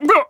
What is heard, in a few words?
Wizard Jump